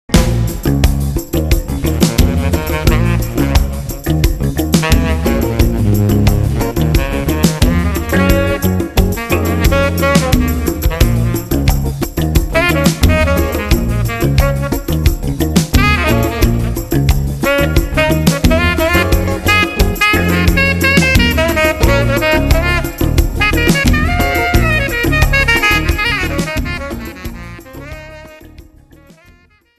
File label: Reggae Lypso